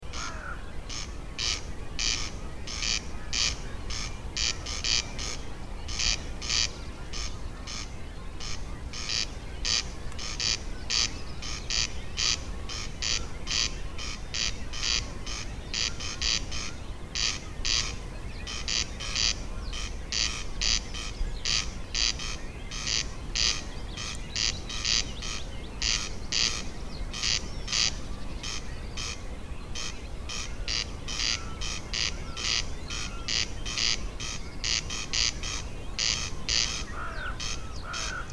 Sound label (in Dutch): Kwartelkoning, Brandenburg